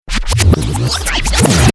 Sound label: Turntable Scratching Reverse; Dj; Musical; Sound Effects:Musical